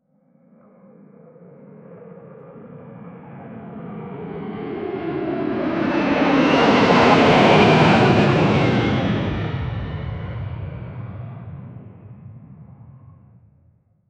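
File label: flyby sound of a commercial, jet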